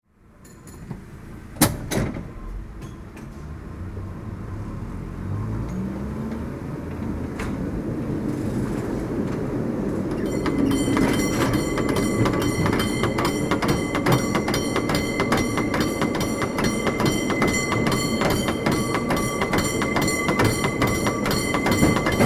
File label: SF Cable Car; Soundzgood